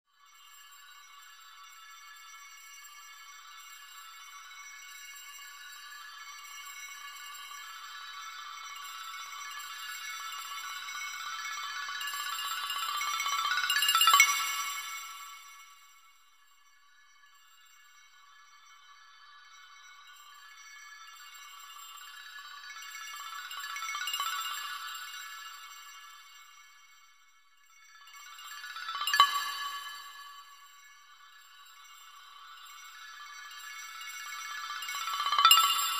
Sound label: sheet metal